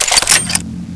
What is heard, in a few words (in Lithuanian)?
lazerinis; cstrike